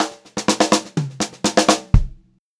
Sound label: 126 Pop-Rock Drumloops